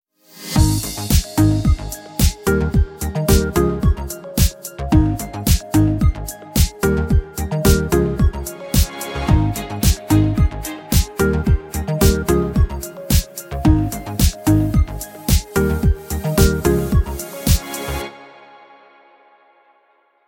Upbeat Pop; MIUI14 and HyperOS 1.0

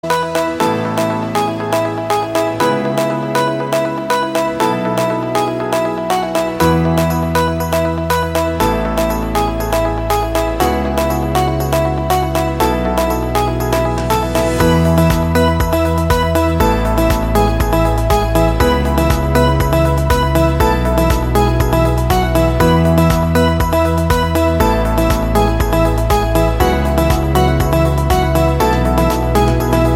Positive Inspirational; MIUI12

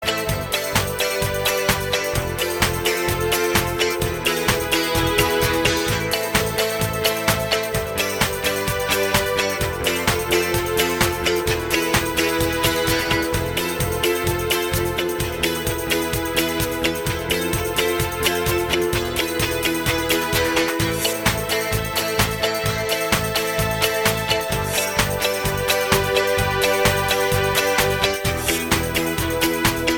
(Instrumental); MIUI12

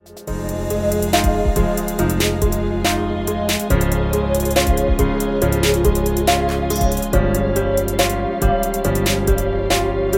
Constant melancholy; MIUI12

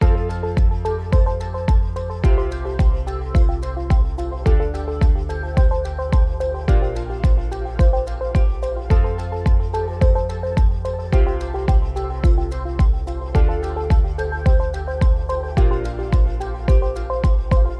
Ambient Tech Background Loop; MIUI12

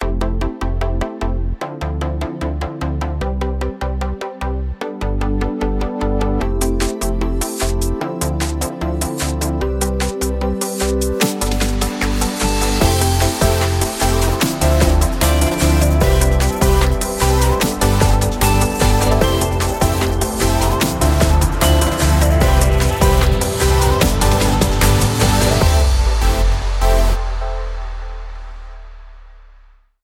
Summer Upbeat Future Bass, ringtone; MIUI14; HyperOS

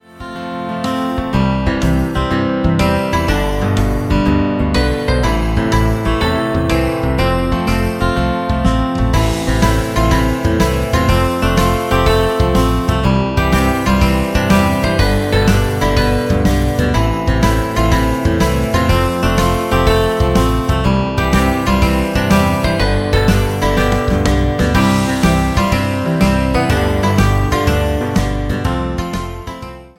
Small bell, ringtone; MIUI14; HyperOS 1.0

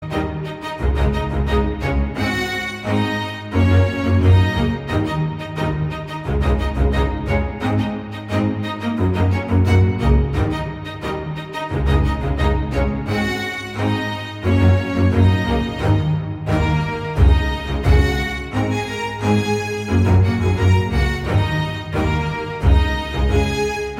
Strings Quintet; MIUI12

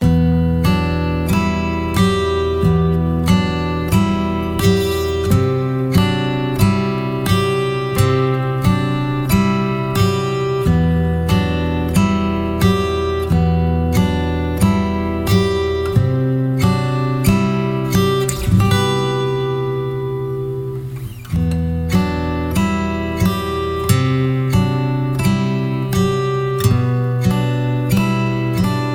Acoust Dramatic Loop; MIUI12

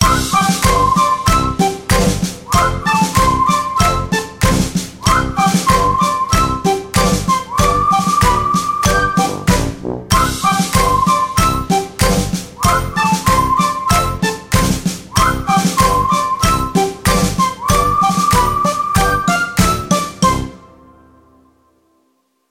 Whistle March, ringtone; Whistle March (short); MIUI14 and HyperOS 1.0

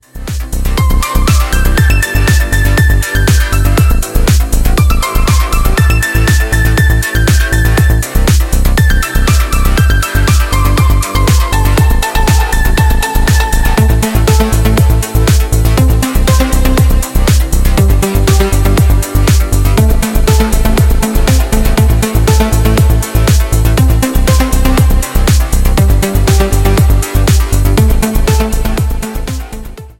Warm-Up Beats

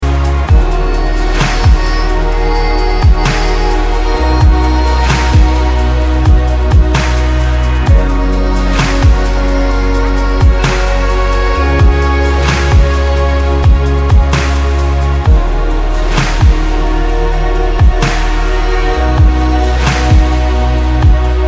Ambient Fluid; MIUI12

